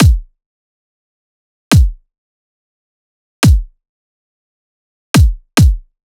Drum Loop 140 Kick; Loops; Ghosthack - Color Bass Essentials

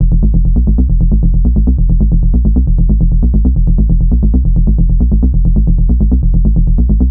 Bass Loop F 135, Techno; Ghosthack; AC2024 - Day 1 - Techno Sounds 2025

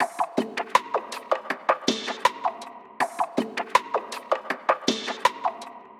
Elastic Perc Loop - 160 BPM; Cymatics; Fiji Hihat Loops & Percussion

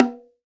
Bongo, World Percussion; Cymatics; PHARAOH Beta Pack; Pharaoh Premium Drum Samples (BETA)

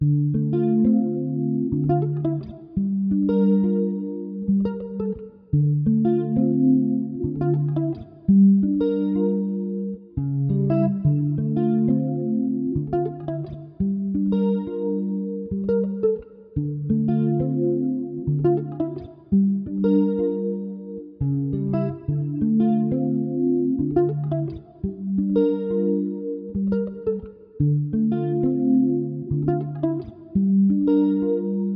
87 BPM D Min Guitar Chord, Afrobeats; Melody; Loop Stems; Waterfall; Cymatics

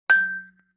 Xylophone Clang G; Vintage Cartoon; Musical Elements

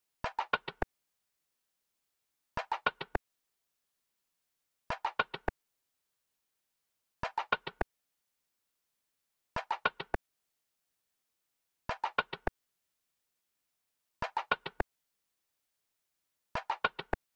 Drum Loop, 103 BPM Percussion; Loop Stems; Cymatics; DOPE DRUMS VOL. 1; Street

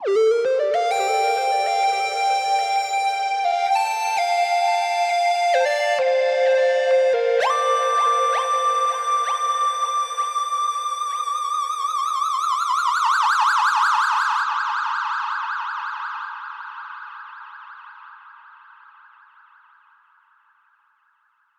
130 BPM G Min Lead, Synth; Melody Loops; Synth Collection; Cymatics; ANALOG EVOLUTION Beta

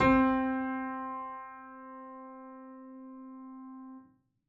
KEYS Forte (C), Melodic One Shots; Cymatics; ANALOG EVOLUTION Beta

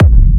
Techno Kick; 30 Techno Kicks 2025; Ghosthack

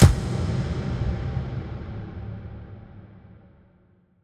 Percussion Hit

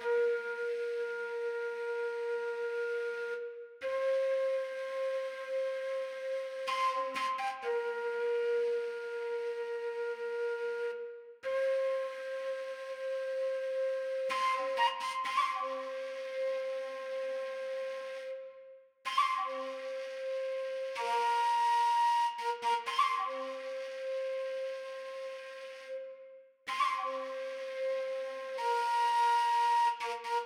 126 BPM C Phrygian Flute; Stems; Cymatics; Deep End

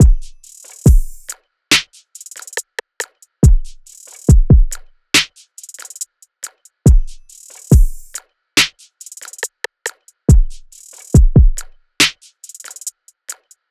Drum Loop 70 BPM; Dialed; Cymatics; Vibes II Drum Loop Collection

